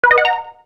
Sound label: RED COIN; YWW